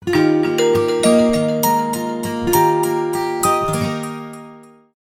Crystal Ball, ringtones; Qiku; Luna Youth

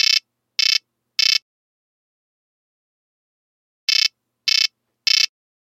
Frog, Nokia 3310; Nokia Design Team SoundCloud

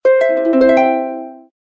Harp; Gome; U7

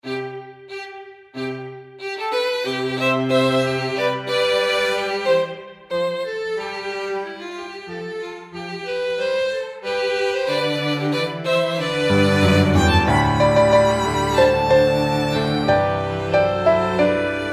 ringtong; Gionee V185

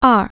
digit 2, keypad tone; ZTE F451

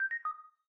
Video Record End, ui; Meitu; V4

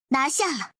Sms, notifications; OnePlus; Ace 6T Genshin Impact Kamisto Ayaka

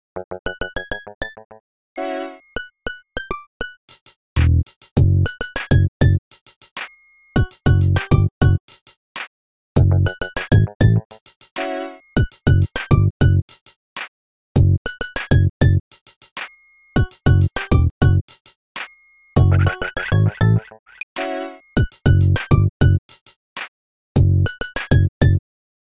R'n B; Nokia; Markus Castrén

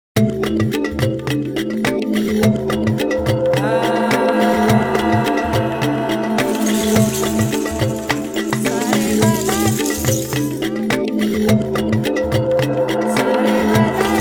Tribal ringtone; Nokia; audio themes

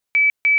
tone dispatch group rx; Motorola; i886

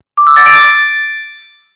camera shutter

